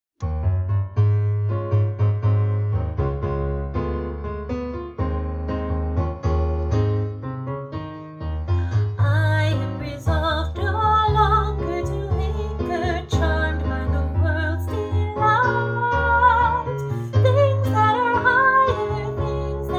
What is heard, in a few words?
Soprano; I Am Resolved; Practice Recordings; Octavos